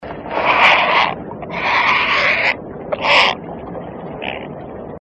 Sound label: Great Blue Heron